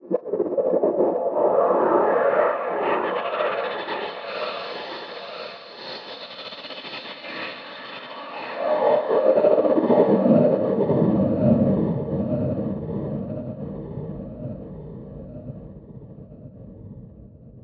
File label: TRANSIT gate filter delays, Cinematic Metal Impacts